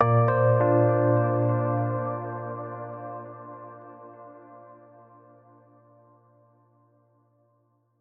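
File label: sounds with an effect of completion, ending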